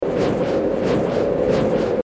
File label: lifeleech warmup